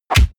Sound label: punch oldschool